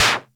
Percussion Computer Tom

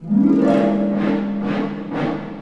Orchestral Loops; 128 PSYCHO

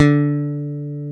D-3 PICK, bass; AKAI S-Series CD-ROM Sound Library VOL-8

